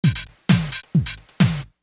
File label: synth drum boom-chicka-boom, drumloops; esoteric